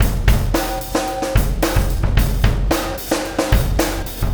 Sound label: Wormhole Beat, Processed Drumkits